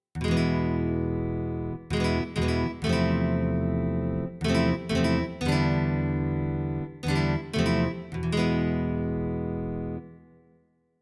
Nylon string guitar; XV-88

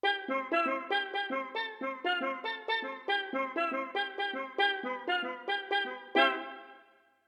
Steel drums; XV-88

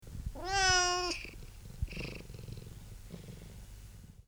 Miaow Purr

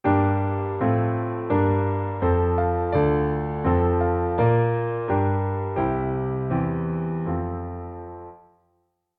Piano, XV-88; Promenade

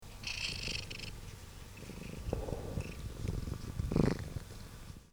Purr

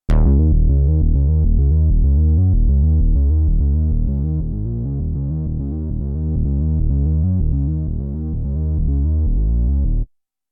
Synth Solo Bass, XV-88

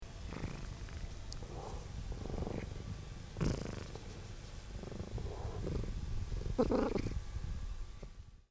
Vocal Purr

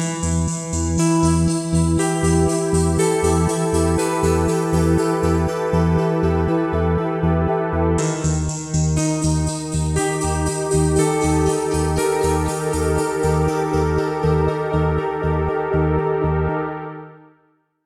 Synth section, XV-88

